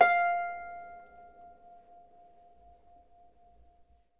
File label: Harp F5; Miroslav Vitous - Percussion & Harp